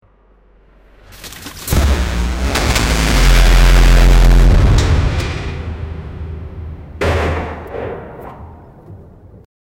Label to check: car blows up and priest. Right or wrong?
Right